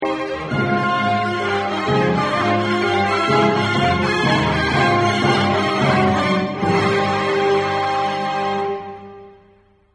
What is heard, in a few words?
cadence piano